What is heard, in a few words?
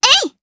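Jump, Japanese; voices; heroes; Rehartna; kingsraid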